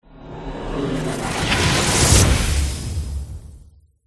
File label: Dark magic large impact